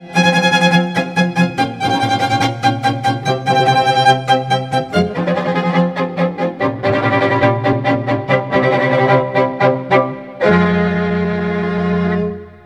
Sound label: Quartet